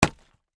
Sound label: Hammer Impact Dry, Industry, Hammer, Impact, Drywall; Motors (Sound Effects:Machines)